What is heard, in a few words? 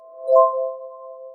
Space